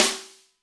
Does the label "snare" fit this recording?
Yes